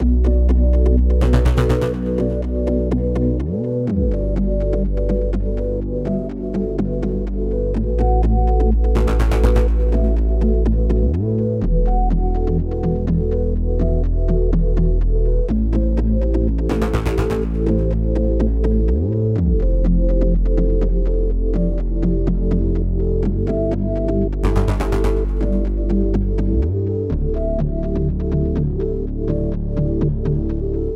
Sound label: dark cold